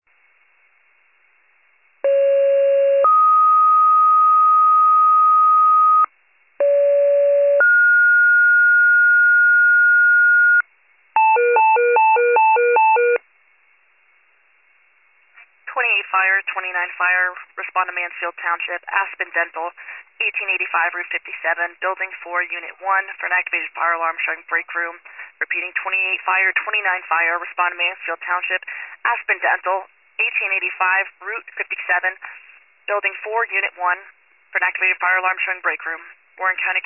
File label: FIRE pager alert